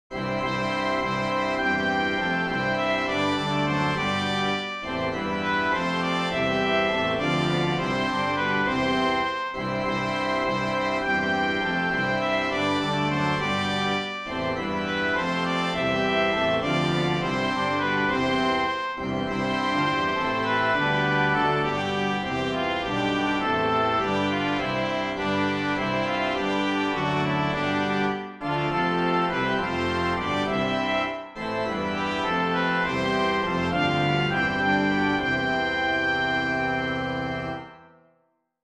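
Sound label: rhythmic Trumpet descant; A Mighty Fortress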